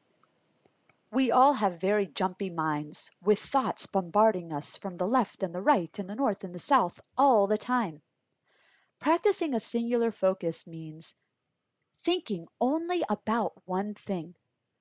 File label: Breathing